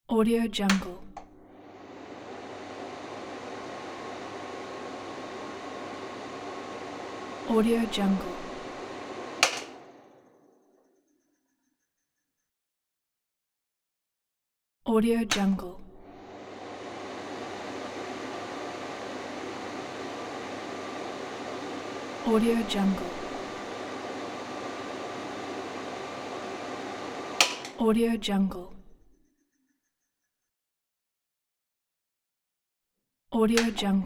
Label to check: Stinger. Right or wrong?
Wrong